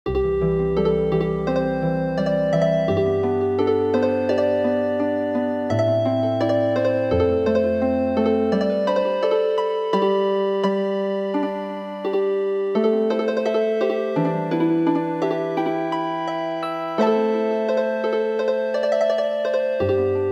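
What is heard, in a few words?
Healing Harp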